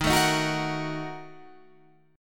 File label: A sharp Major 7th Suspended 4th Sharp 5th